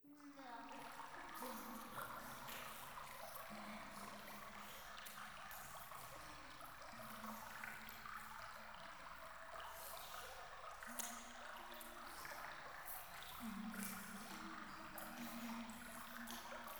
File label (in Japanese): フェラチオ 川のせせらぎ